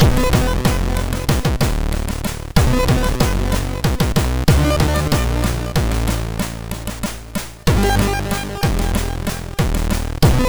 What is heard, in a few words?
Scary Stuff, MOS6581R2